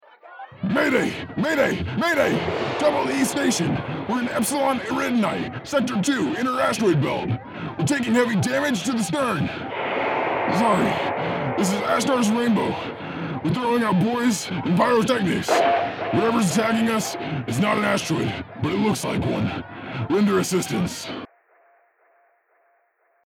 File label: Distress Signal